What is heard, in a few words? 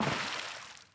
wet step; DAoC